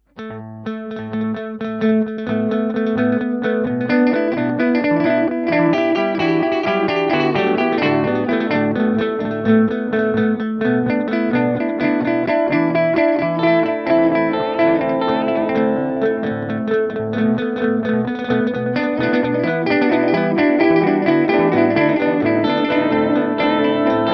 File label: git warped; hologram electronics microcosm